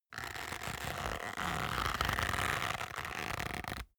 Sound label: leather creak long soft